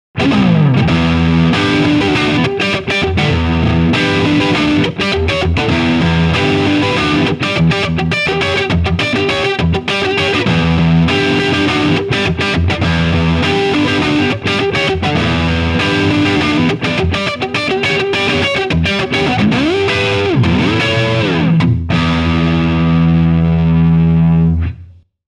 Neck PU 77 Marshall 2203 Marshall 412 300 Watt Shure SM57